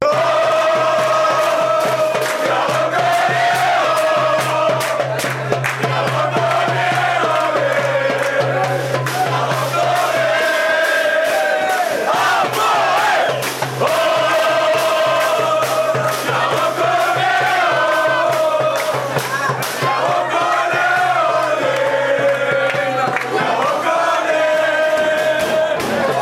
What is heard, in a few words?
green ole oh, fanchants